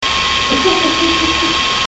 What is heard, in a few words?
laugh